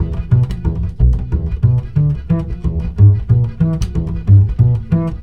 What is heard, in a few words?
BASS, Balkanish Traditions